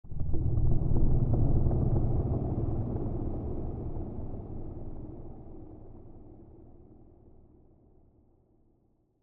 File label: Fx Atmospheric Distant; MatiasMacSD; Dare Small Pack